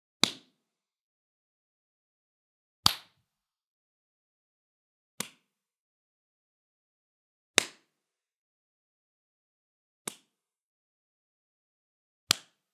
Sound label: Slapping